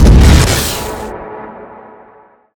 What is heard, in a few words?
propulsion zap, weapons; deluge